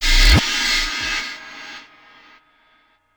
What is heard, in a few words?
Electronic Fx Hit; Sonic Foundry (Sony Creative Software); Electronic Point Blank